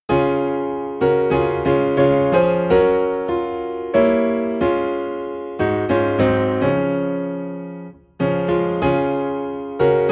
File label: Piano in D Flat; Jesus Paid It All